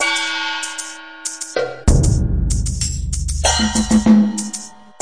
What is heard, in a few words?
taiko crashers, 96 bpm; 091 to 100 bpm